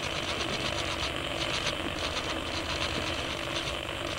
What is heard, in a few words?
Signal Translator Local